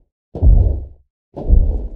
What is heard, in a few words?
Sandscrit Walk Sniff loop